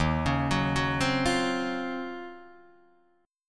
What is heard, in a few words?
Eb Minor 13th Eb down Guitar Open D